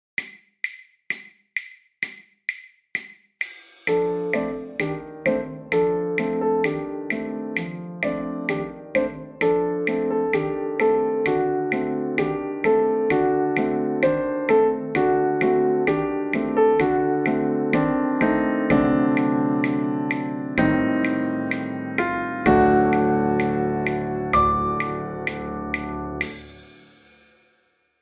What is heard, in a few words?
Counting in Twos Example With Beat